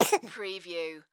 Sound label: Baby Cough; sound effects bible